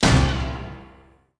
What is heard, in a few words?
Exclamation; win98